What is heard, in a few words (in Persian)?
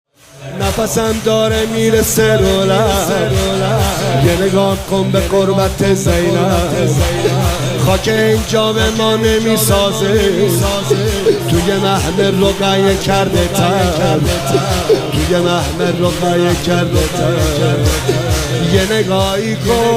مداحی; شور